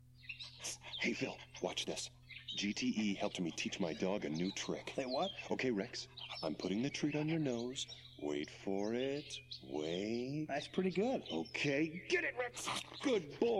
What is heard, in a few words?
lead voice